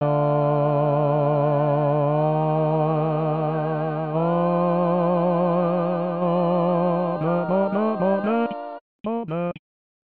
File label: Bass